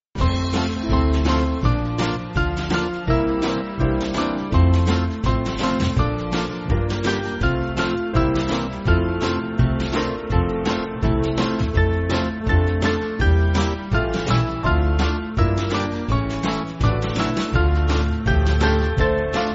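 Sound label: Band, Music Backing Files for Churches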